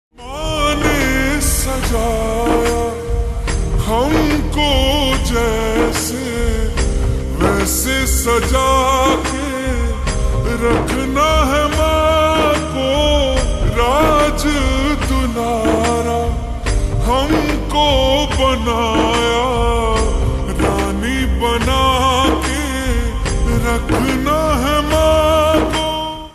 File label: Ringtone, slowed and reverb